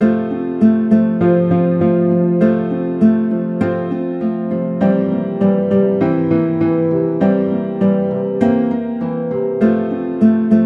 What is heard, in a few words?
Guitar